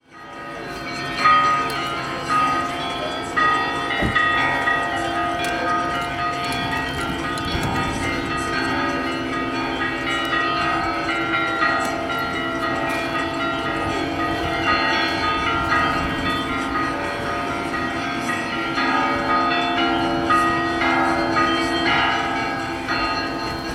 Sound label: bells street ambiance sergiyev posad; 1 udarnoy armii; alexey kurashov